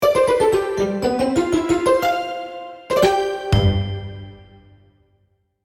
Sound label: jingle